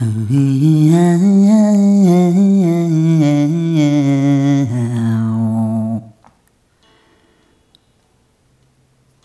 Rhythmic A

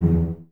CES E Pizz, Cellos; Miroslav Vitous; String Ensembles

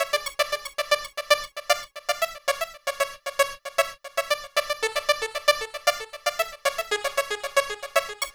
Guitar Based; Eclectic Electronica

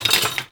Spoons, household rhythm samples hits

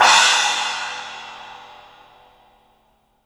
Studio Cymbals; E-MU Producer Series Vol. 5 – 3-D Audio Collection; 3D Percussives

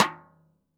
xstick - snares off; JazzFunkKit

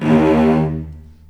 D#1 AT LNG R, VCS ARCO, String Collection; East West; The Ultimate String Collection

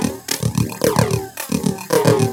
LOOP, TECHNO; TECHNO-BOX; ELEC-LOOPS

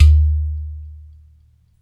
UDU, Supreme Beats - African Contem

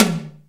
PWR TOM HI; Back In Time Records; Big Bang

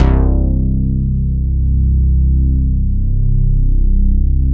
MKS BASS

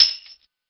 Jingle Bell, Genesis; Urban Flow

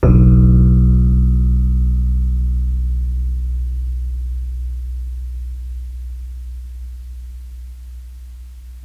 RHODES FX; E-MU Producer Series Vol. 8 – Platinum Phatt; EP FX

